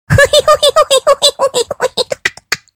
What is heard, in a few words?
huhihuhi; props taunts new; phx new taunts pack